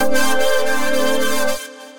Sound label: Synth Lead; Dorian the Great